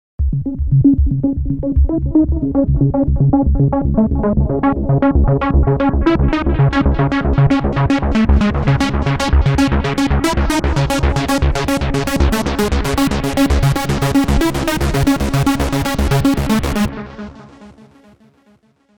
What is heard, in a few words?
trance lead, jupiter6